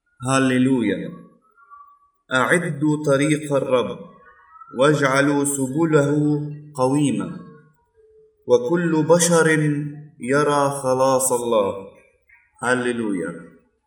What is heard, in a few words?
Gospel Antiphon; Sunday Advent